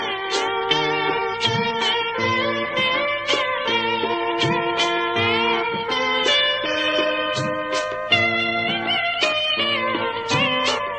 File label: sky tone, ringtones